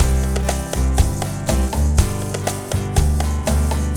Mambo; Old Lounge Recordz Mixes

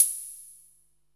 CYM2, Electro-Harmonix DRM-32

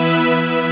BIG PAD, HOUSE; WORS STATION

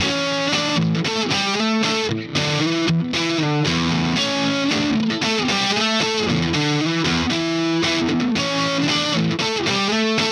Melody Riff Guitar, Indie Rock

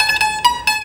TANBURA; Best Service; World Colours

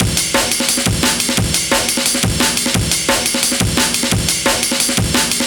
Amen EQ 175, classic dnb breaks

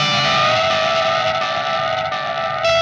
Static FX Guitar; Sonic Foundry (Sony Creative Software); Indie Rock Anthems; Session 13 - Static

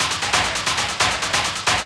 drumbreak, 130

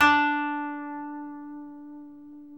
KOTO MILD

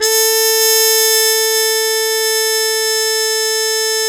MUTE A1, Mood Trumpet; E-MU Formula 4000 Series Vol. 1; Hip Hop Nation

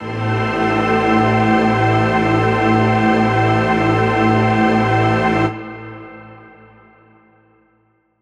Distorted String; Strings & Pads; Zero-G Sound Sense Grime Scene; 90 s Sample CDs

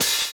Open Hhat, Hihat; Equipped Music; Loungin House

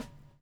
BUBBLE; Spectrasonics - Backbeat

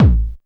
kick techno, drums; Techno Trance Essentials

